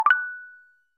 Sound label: notification sound